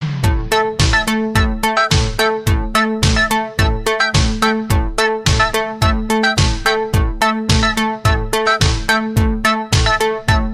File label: Magic Carillon